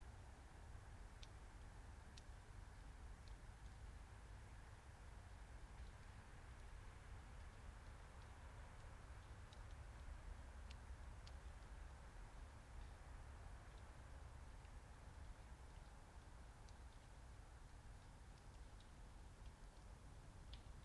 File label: yamanakako sound